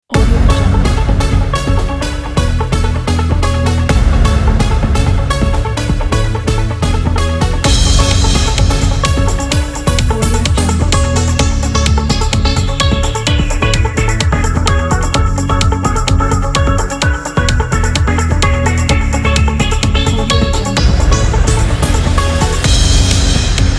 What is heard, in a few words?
separator dynamic pop